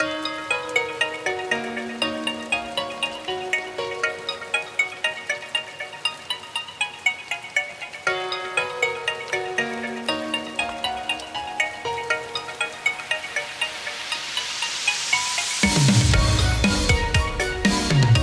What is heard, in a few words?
Cryfield (Instrumental); friday night funkin vs. gorefield v2 ost 2024